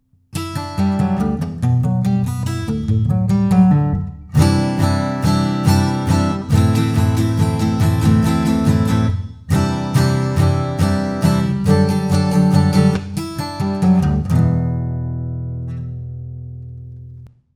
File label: Acoustic